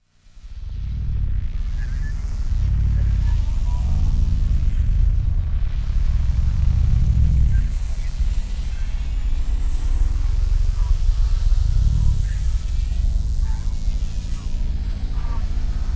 cinematic drone or ambience sparking creaking buzzing organic whale like sweeping beluga; sonniss gdc